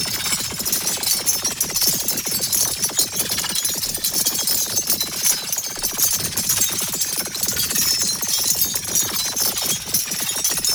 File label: Summon Chain Loop; Warframe; Uriel; Catenach